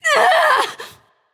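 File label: ouch, female; smite; voicepack; hera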